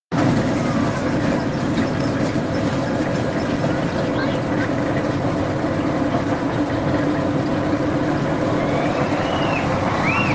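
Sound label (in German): Marienkäferbahn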